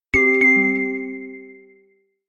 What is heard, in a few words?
Sonar Goose Ping